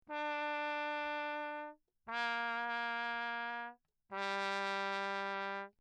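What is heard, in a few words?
trumpet mute Lechner low; Perinétventiltrompete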